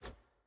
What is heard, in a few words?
ui generic rewards hover